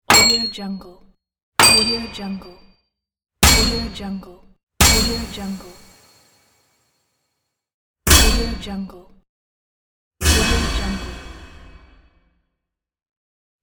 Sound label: metallic hit